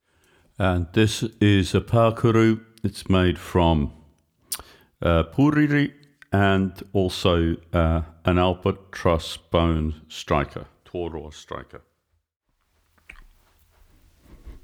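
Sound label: Pakuru, Taonga Puoro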